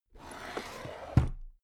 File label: drawer cabinet stuff close regular